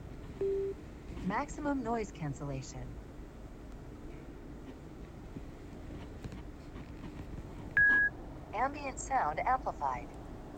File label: Left dial